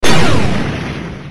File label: Laser